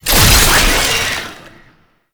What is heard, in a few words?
Incarnon Wrist Gun Transform, Weapons; Warframe; Tenno